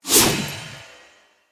Spear Throw Perfect; Weapons; Player

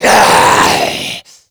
Mariner Zombie Attack, Vocals

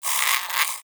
Rodent Vocal Snore

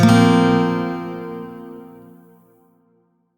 Shawzin Blues Chord C; Warframe; Lotus Shawzin

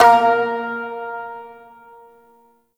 Shawzin Oct One A Sharp; Warframe

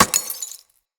Bullet Impact Glass Large; Weapons

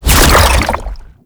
Treasure Box Proj Throw; Warframe; ManInTheWall

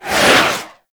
Heavy Orbital Strike Whiz By; Abilities; Warframe; Tau; Dax Heavy

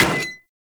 Melee Axe Impact Generic; Axes

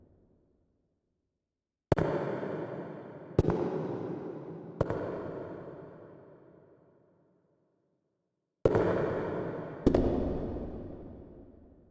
Steps Close, Foley; Ballas; Warframe; Cinematics; Apostasy

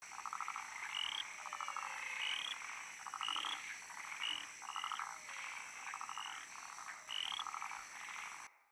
Frog Loop Night; Ambience; Midrath Island

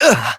Generic Short Pain Operator Voice; Operator Mission

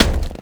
Crewman Footstep Walk

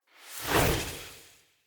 Staff Caster Cast Long; Weapons; Staves; Starter Staff Caster

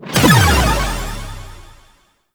Hi Score Revive; Warframe; Nineteeen Ninety Nine; Deep Archimedia

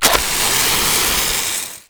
Maggot Spark Start; Warframe; Abilities

